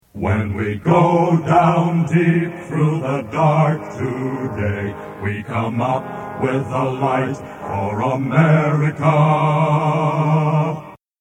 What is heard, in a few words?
Male Voice Choir